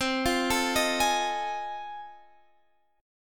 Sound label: F 7th Sharp 9th C